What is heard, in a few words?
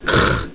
COUGH